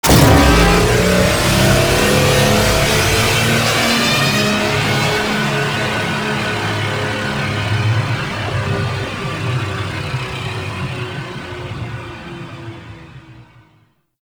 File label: Railjack Ship Boost Engine; Warframe; Vehicles